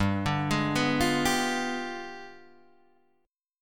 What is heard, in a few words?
G 7th Flat 9th, Guitar, Open D